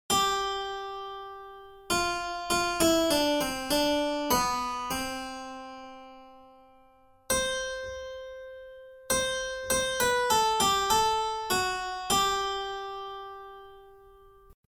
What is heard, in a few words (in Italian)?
Tema e risposta